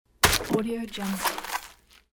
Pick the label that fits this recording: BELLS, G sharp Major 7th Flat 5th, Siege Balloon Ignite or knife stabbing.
knife stabbing